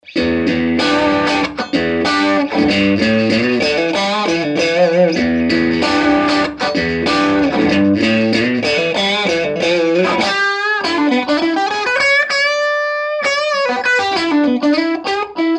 crunch middle bridge, Strat; schwarzbach; VP-Strat-56

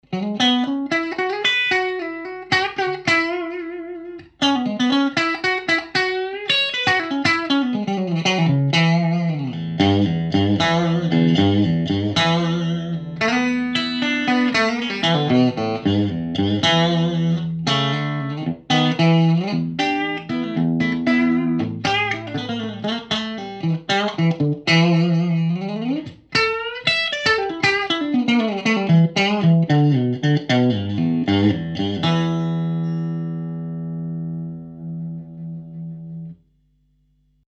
overwound clean bridge; schwarzbach

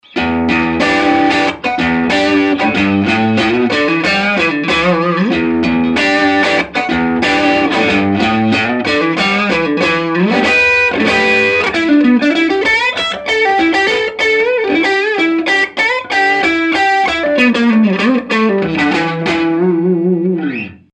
crunch middle; schwarzbach

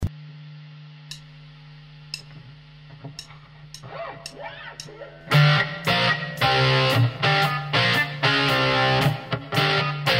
dist, strat vintage

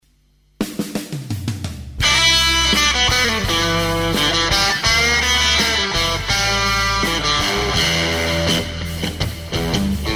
Bridge Marsh4

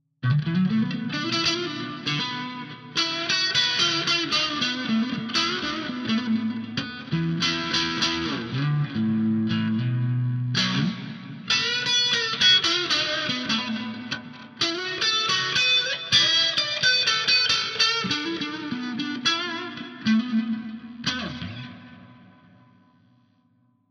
dist neck, strat

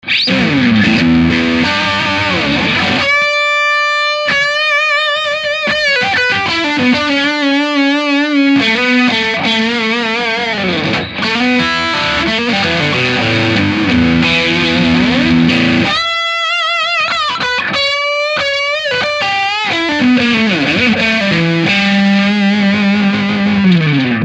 brett neck middle, Strat; schwarzbach; VP-Strat-66

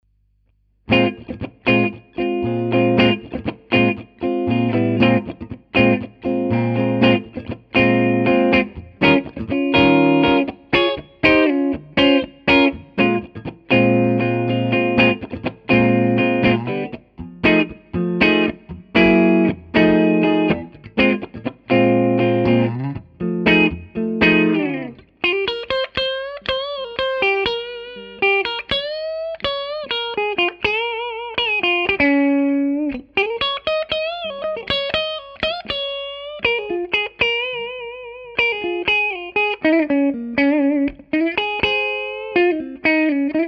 clean neck bridge; schwarzbach; HB59-GrowlDogs

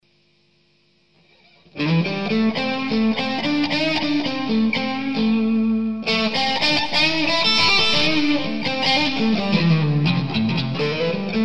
Mitte Marsh Green OD, vp strat56

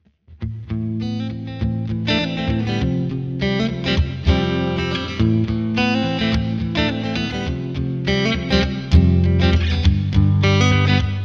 P90 Twin Clean

